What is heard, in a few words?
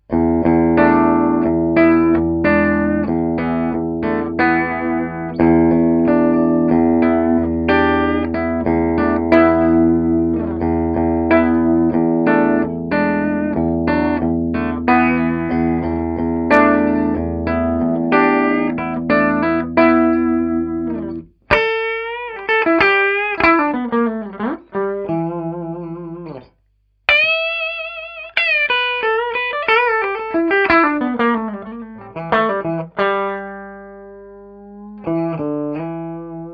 clean; schwarzbach RedZoneH; Bridge - CLEAN; Sounddemos Stratocaster Pickups; Orange Tiny Terror